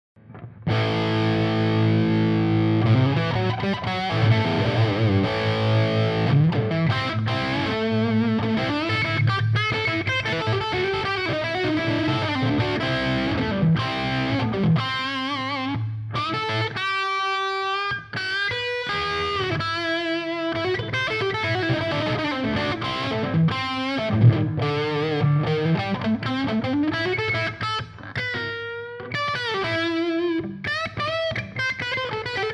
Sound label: 50w lead vintage; Lableue 50w - canal lead 3 - vintage